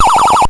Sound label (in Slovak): hraci automat; technika